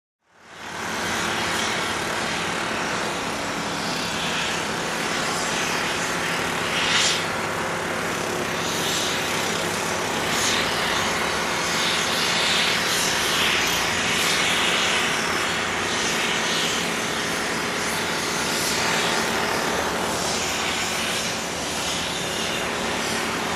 Pressure Washer Machine Cleaning Construction Area